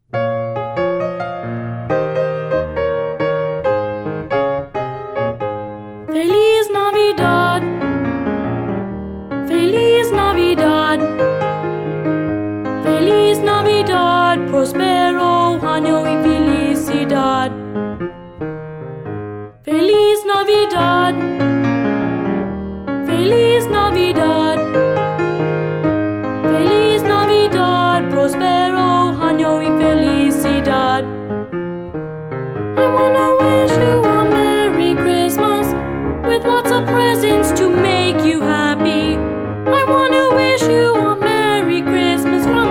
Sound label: vox